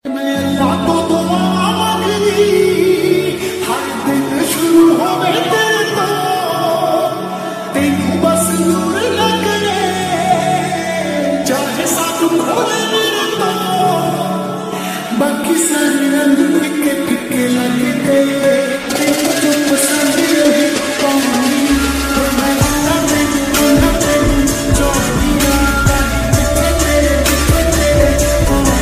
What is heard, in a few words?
Punjabi Ringtone